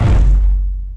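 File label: wood hit large; objects; Star Wars Obi-Wan; XBOX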